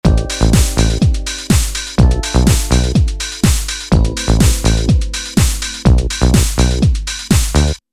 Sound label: house; minitaur